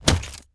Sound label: Cyber Punch